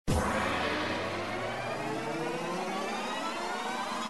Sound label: BONUS ANTICIPATION; Lucky Wok